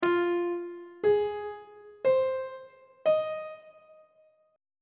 a sharp aeolian 7th chord 5 is e sharp minor 7th on treble clef